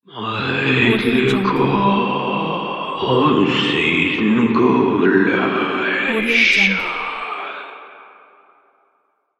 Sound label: ancient ritual